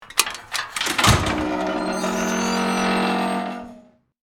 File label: Cinematic door and lock open; household